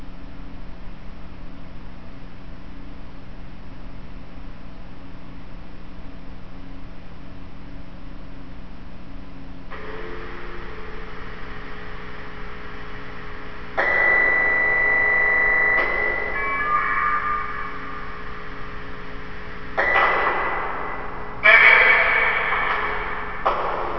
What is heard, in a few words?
input optics mic